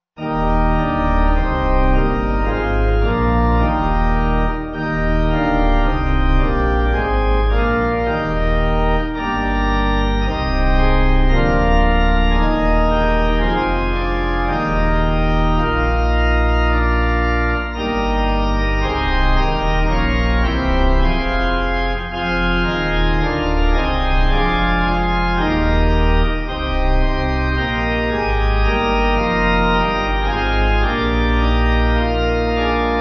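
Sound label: Pipe, pipe organ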